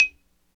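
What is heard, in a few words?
XYLO, Afro Xylophone; Roland LCDP11 Africa VOL-1; Bala & Xylo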